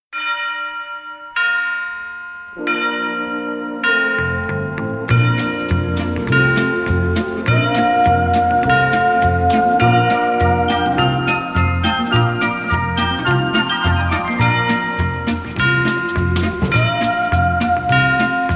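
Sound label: New Year music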